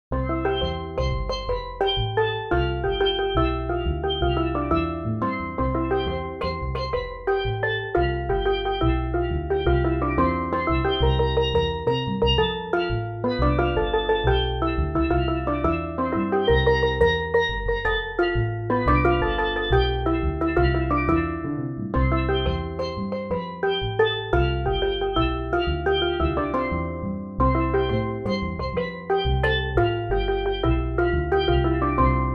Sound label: steeldrum; steelpans; pianoteq7